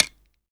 short reverb hi hat closed sound d key; hat samples; Duration 0:01; Samplerate 44100 Hz; Channels Mono

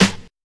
hot snare sample f sharp key

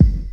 1980s rap kick drum single hit g key

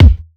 punchy kickdrum sound f key

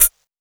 sparkly hat one shot d sharp key

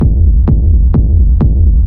Techno Sub Kick Black Spread; drum loops

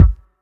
long room reverb bass drum F sharp key

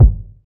nice steel kick drum sample f sharp key

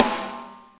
loud acoustic snare sound a sharp key

